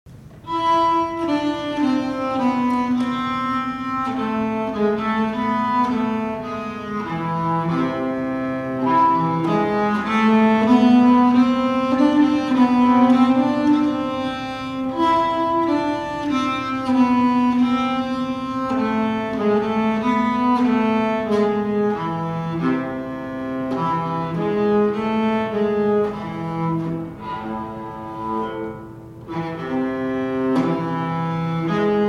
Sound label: (slow) (harmony); Halleforsnasarn; slangpolska southern